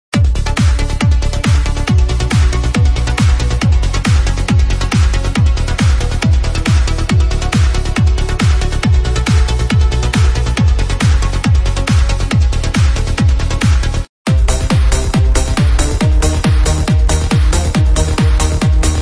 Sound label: UPLIFTING TRANCE; IMMENSE; CLUB ARENA SONGSTARTERS